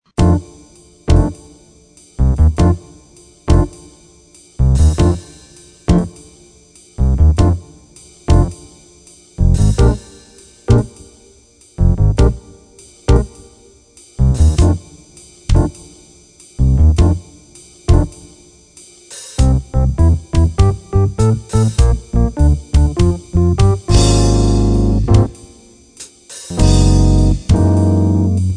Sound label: jazz blues